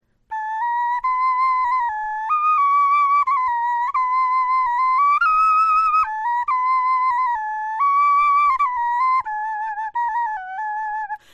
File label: melodie, knochenfloeten